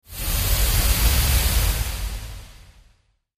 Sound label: Processed Air Release